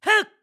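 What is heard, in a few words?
Jump Attack, voice; BOTW; Breath of the Wild; Link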